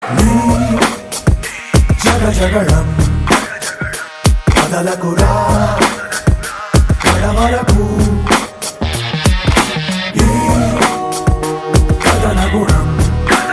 Anthem BGM Ringtone; Varun Tej

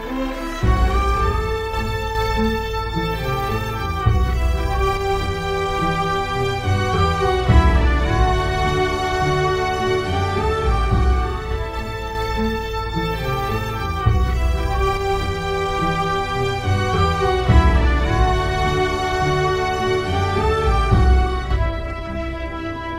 Trailer BGM Ringtone; Viduthalai Part 1

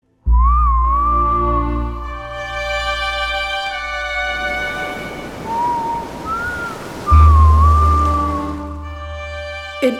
Whistle; Veyil

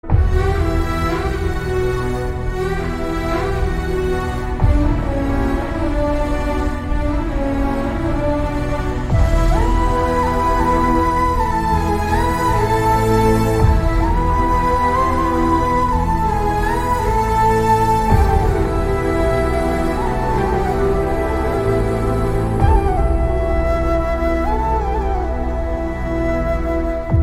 Instrumental

